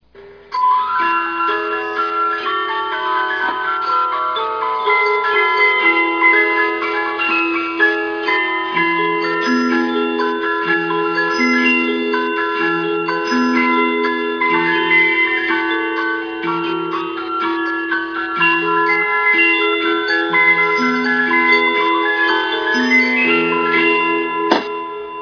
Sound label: Sm Poly Bells